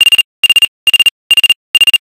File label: Short Normal Calling, notifications; Fujitsu F-08D